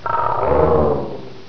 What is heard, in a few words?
AMB; ABUSE